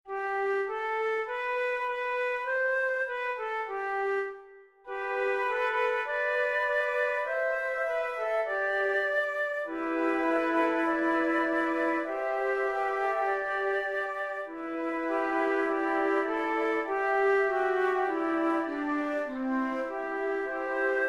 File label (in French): canon a 3 voix